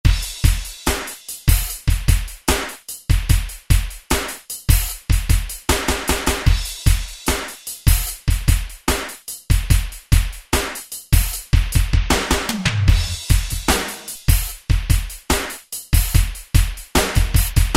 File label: Generator Kit, Kurzweil K2000; Kurzweil K2000 Contemporary ROM